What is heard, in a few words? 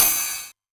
909 Ride, Drum Machines